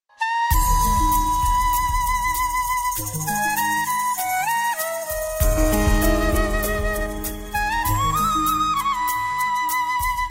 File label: Best flute ring